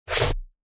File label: tip show